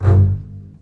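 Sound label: basses G1 marcato short; East West - The Ultimate String Collection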